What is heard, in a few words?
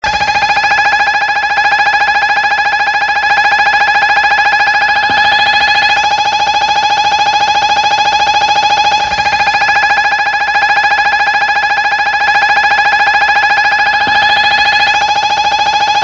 invaders ship moving, arcade; mid invaders